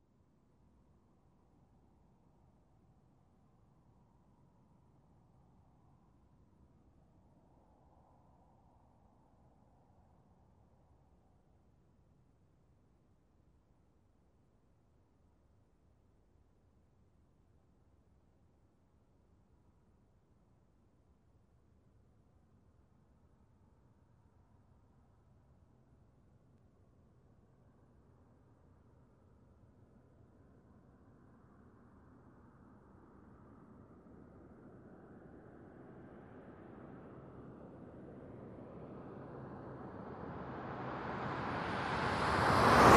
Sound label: Car passing by at normal speed